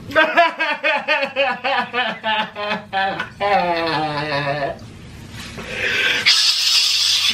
crying shhh; nikocado

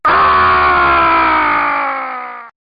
screaming; chunky kong

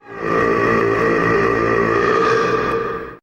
burp

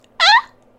squeak

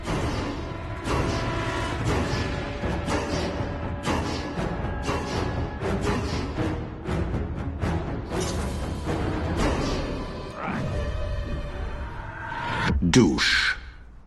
douche chant

